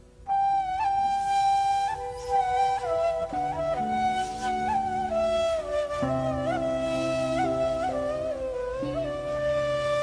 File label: ney; alternative